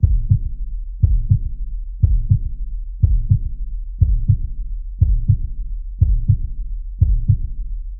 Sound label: Heartbeat Loop Medium; UltimateFishing2020